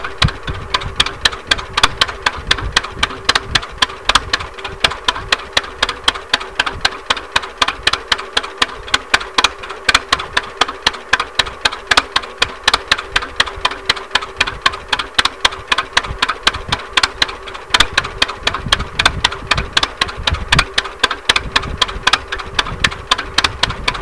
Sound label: idle, tractors; poland